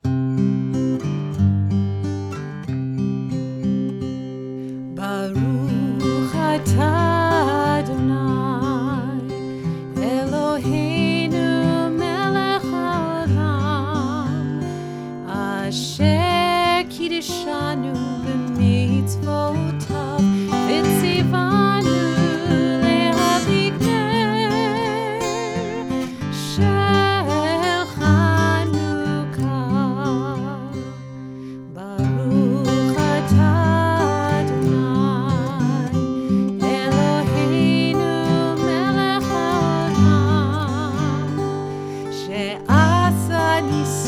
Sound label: chanukah brachot backing track melody